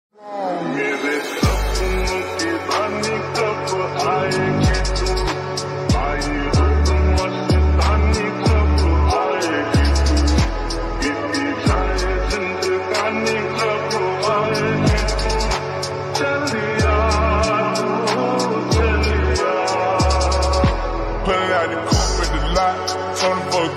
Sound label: remix lofi ringtone love ringtone